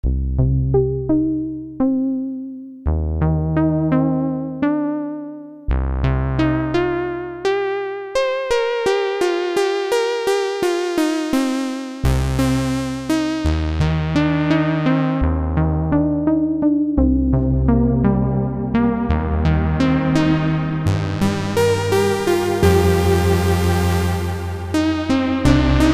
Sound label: Matrix6r trancey lead